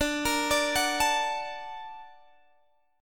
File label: Bb Augmented Major 7th D